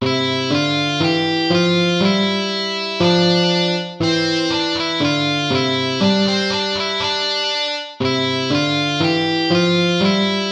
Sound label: gavotta; hook james